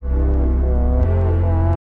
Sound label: house synth 125 A, keys